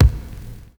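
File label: noizy bass drum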